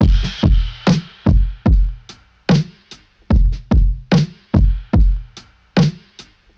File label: stuttering fool, Drum loops Boombap